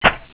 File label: clip in, weapons; charger7